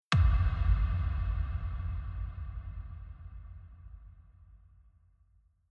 Reverb Delay Hit Reverb On; Mix Insert

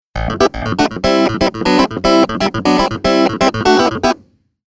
Keyboard Clavi Preset

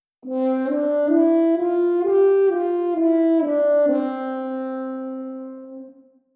legato

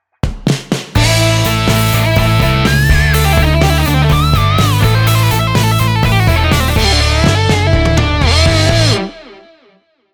guitar

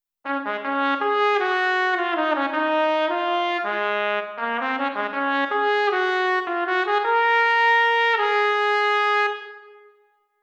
breath

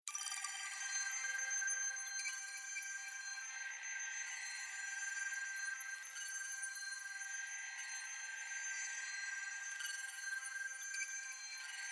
Future bass Chord Pad Texture

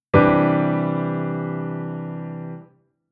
Chord Poly; Jissen

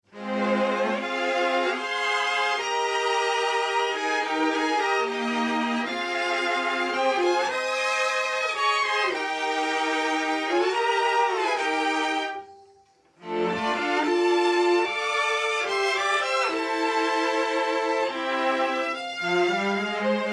Mix Strings Amb Cmp On

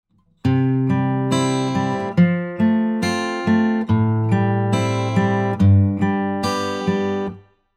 guitar Arpeggio

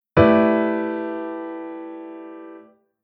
Chord Hybrid; Jissen

